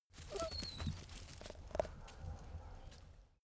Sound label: dog teeth chatter squeak; natur-tiere; hunde